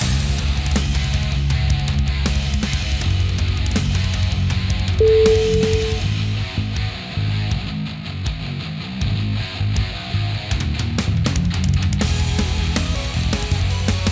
rock

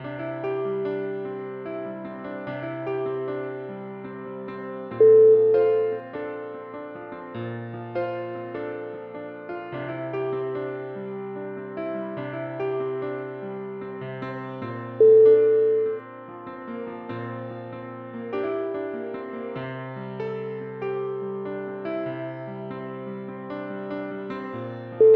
piano breeze

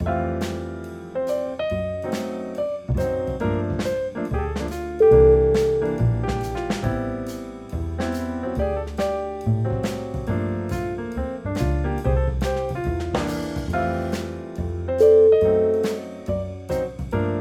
smooth jazz night

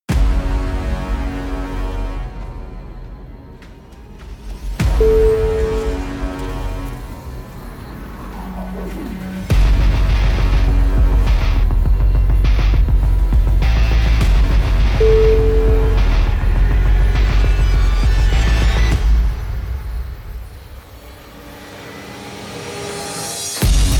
industrial future

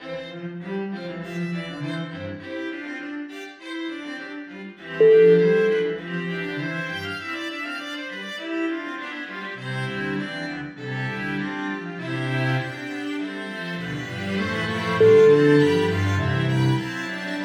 string sextet

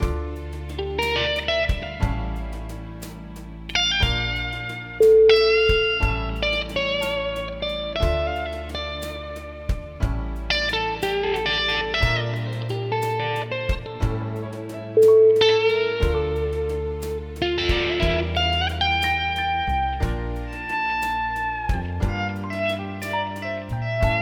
jazzy blues cafe

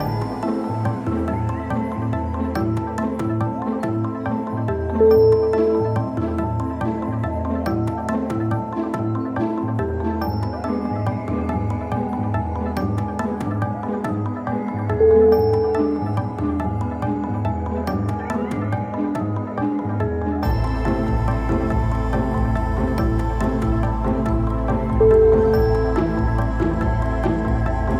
spy fiction

